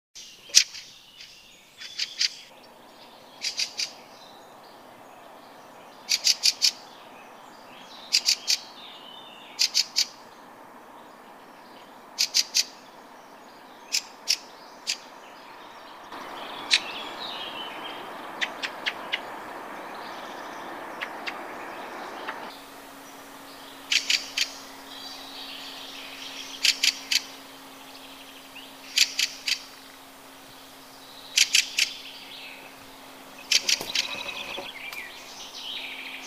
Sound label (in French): grive litorne